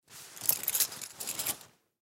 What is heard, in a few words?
Key Ring Pocket, Key Ring Put In Pocket; Rustling; Foley; Objects; Blastwave